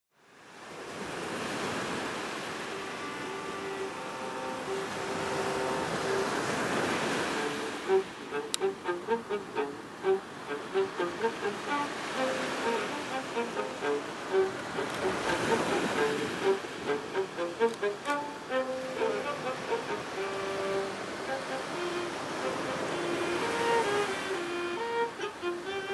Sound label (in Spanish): Sonido de viola